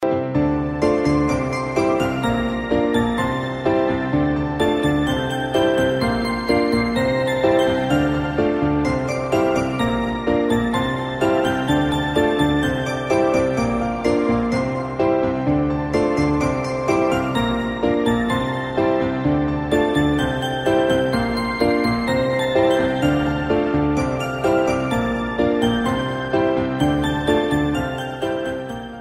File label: instrumental ringtone